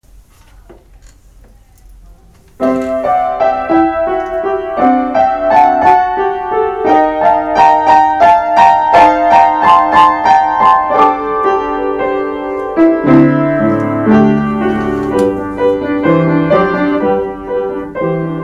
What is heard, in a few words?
piano